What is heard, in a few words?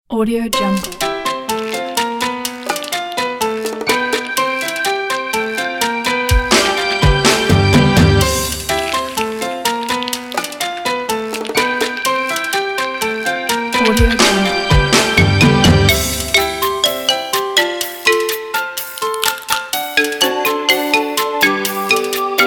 summer samba pack